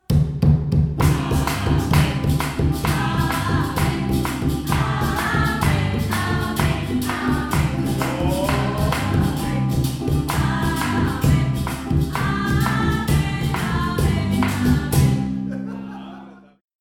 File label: amen